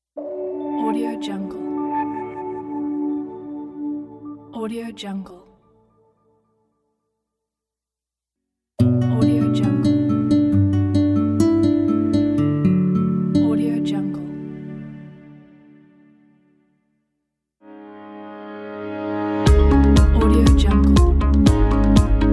corporate logo pack

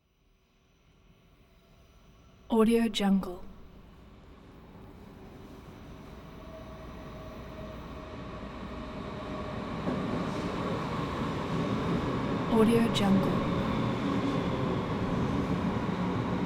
transportation train underground station arrive

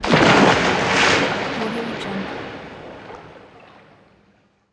dive into water

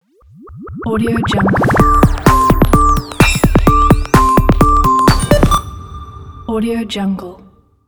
bleep logo

